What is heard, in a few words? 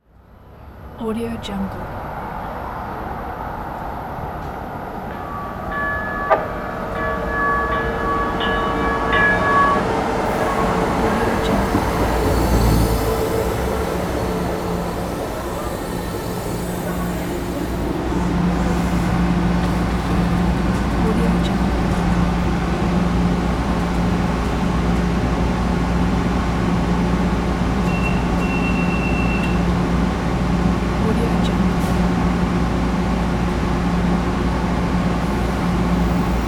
electric train